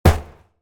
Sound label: empty barrel hit, drums